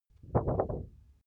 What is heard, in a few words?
paper wobble shake